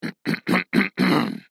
zvuki pershenie v gorle kkhm

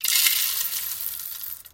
zvuki kofeinykh zeren

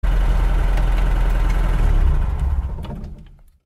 zvuki nivy

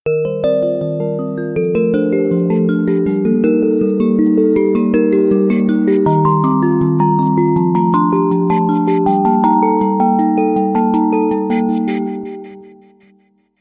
Nokia 808 originalnye ringtony i uvedomleniia

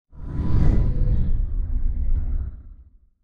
zvuki s effektom peremeshcheniia videokamery

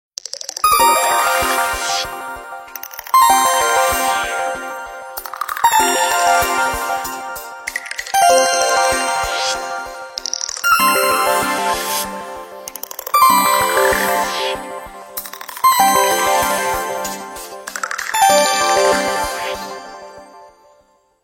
originalnye ringtony i uvedomleniia nokia asha 302

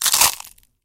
zvuki vaflei

